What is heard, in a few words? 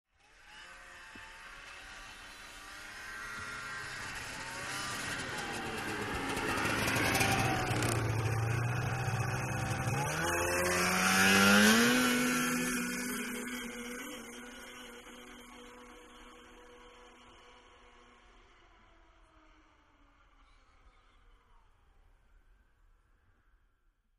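Snowmobile 550cc Engine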